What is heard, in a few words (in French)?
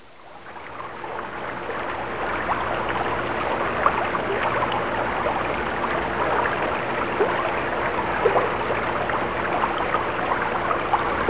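eau, bruits de la nature; sons nature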